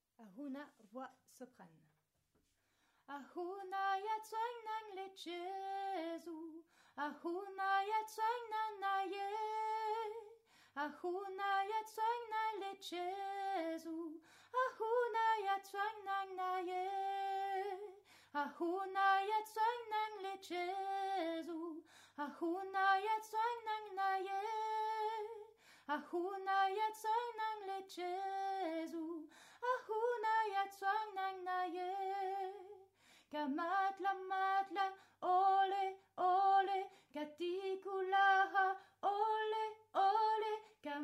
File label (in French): Soprane